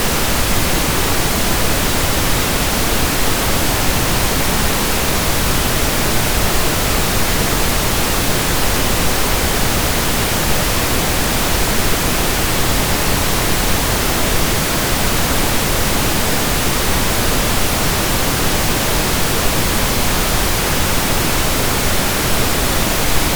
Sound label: Pink Noise